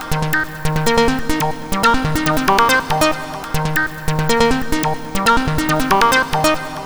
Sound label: Air, Techno Melody; TechnoMusicMaker